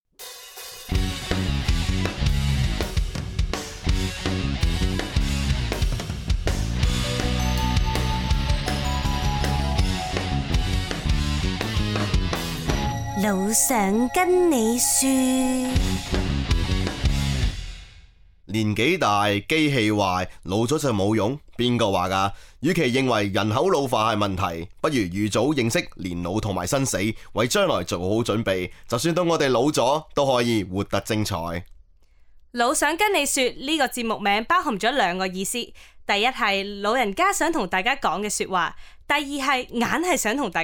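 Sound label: Jingle Oldies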